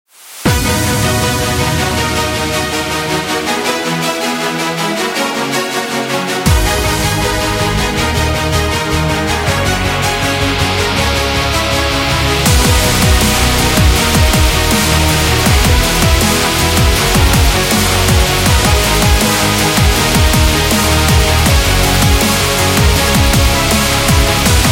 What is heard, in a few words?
Competition, metal